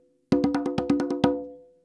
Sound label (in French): frise, congas